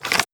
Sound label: archer foley bow handling; Aragami